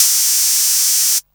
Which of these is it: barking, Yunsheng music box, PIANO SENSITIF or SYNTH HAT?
SYNTH HAT